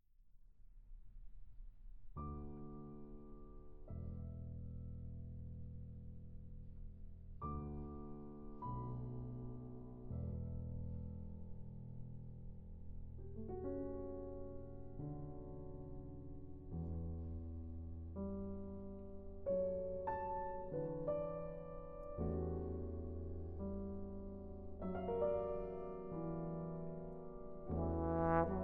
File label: Sonata for Trombone & Piano - Lento- Espressivo, Vivo- Tarantella